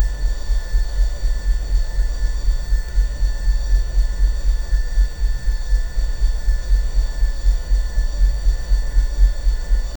controlled medical ambian

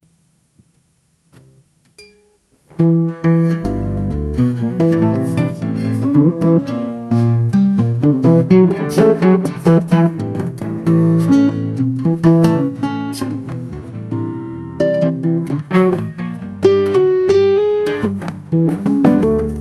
a dark blues song that

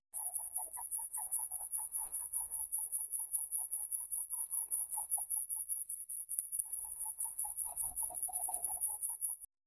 hungry fungi and bacteria, creatures

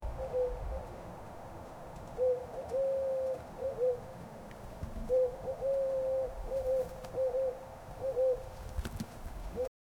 birds

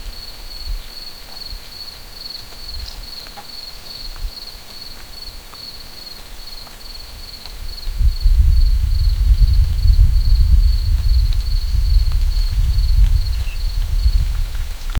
quiet communication betwe, mushrooms

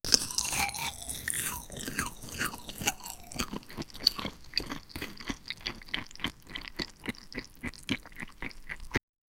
eating crispy fried chick

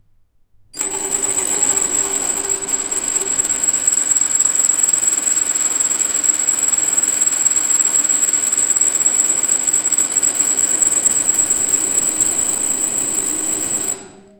tailoring machine sound, clattering

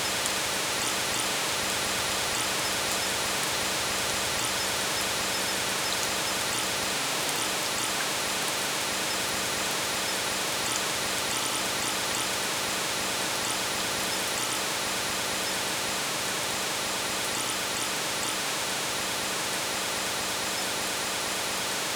rainforest

